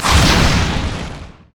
chaos storm; LoL; viktor

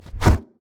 dragon wing, monsters; LoL

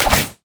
minefield; LoL; ziggs poolparty

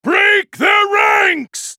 spell cast effort; Garen; Character Voices - English

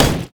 golem basic attack; monsters; LoL

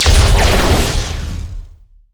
hypercharge; LoL; jayce

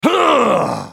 spellcast effort; JarvanIV

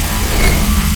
death ray; LoL; viktor skin03

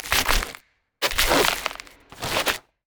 emote laugh; Zyra

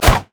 basic attacks; Ziggs; poolparty

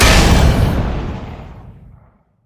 dash; Vi

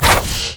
decoy swipe; LoL; monkeyking

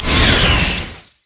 carpet bomb; rumble skin03

